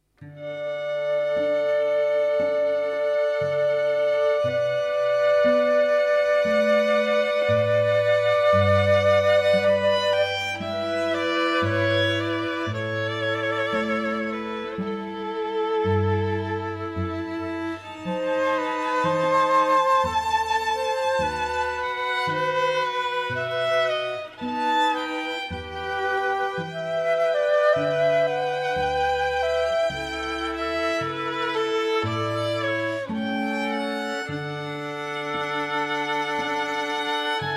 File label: Quartet; Air on a G string by Bach